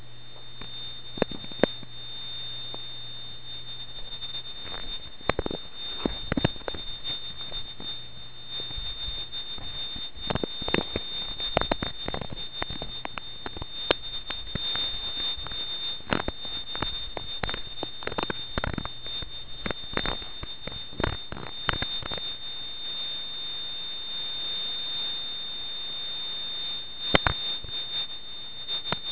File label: a 16 Khz tone signal monitoring movement no counting